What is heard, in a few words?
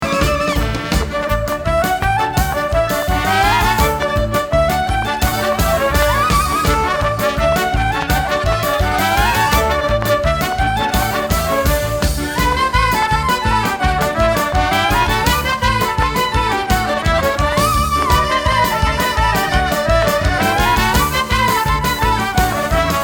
Klezmer tune